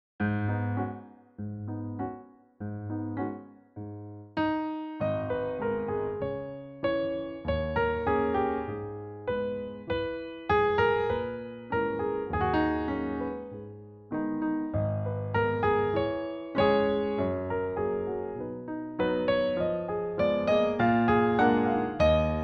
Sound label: Barre Battements Tendus Glisses, audition music; associate audition music; Prep Boy